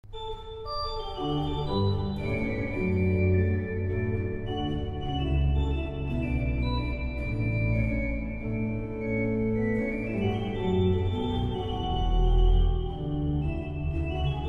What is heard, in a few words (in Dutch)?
orgel